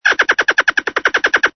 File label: ANIMAL CALL